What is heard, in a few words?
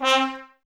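trumpets C, brass; 90 s Sample CDs; Miroslav Vitous; Woodwind Brass Ensemble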